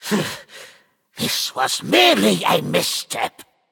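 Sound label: non-verbal boohoo; smite; voicepack; jingwei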